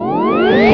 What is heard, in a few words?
one up; Robotron; PC Gamer 39